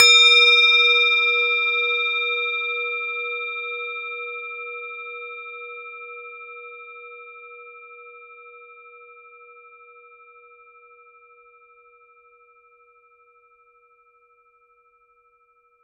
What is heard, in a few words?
singing bowl